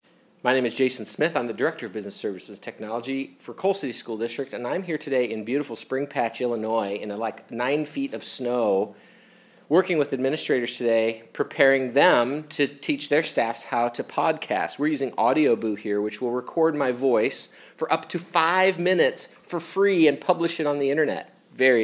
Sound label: spring patch